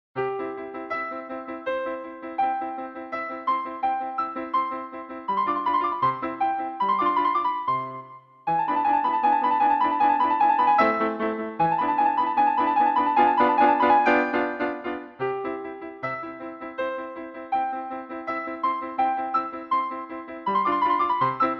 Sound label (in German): Klavierubung in C Dur, pianoforte